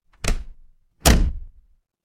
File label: door close